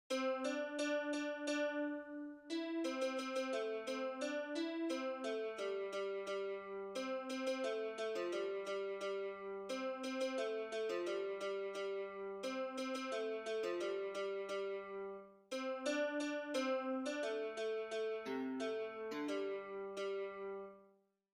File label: ambient japanese music